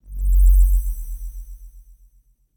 Menu Low Tone

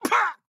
town human road hit; Blind Drive

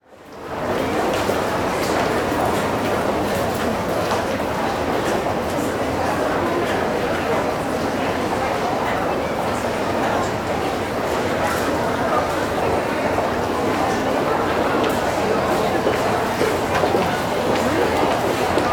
Store Large Department Store, Walla; Sound Ideas; Walla Series

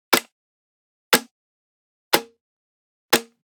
Generic Button Plastic Medium; boom library; The Interface

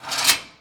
Door Small Metal Flap Open

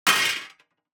DICE on Metal, Throw and Roll, Metal, Two Dice; Samples; ArticulatedSounds; DICE Sfx Party (Extended)

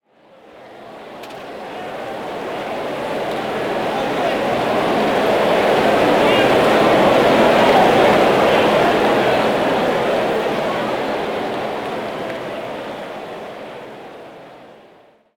Crowd Ambience; Pitch Black Audio Pong

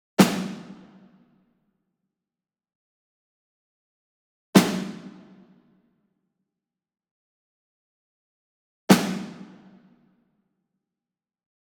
SINGLE SNARE Ludwig Black Beauty Hit Medium; boom library; Cinematic Strikes Construction Kit

